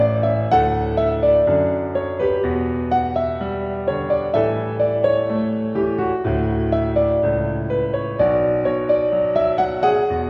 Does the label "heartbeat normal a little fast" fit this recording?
No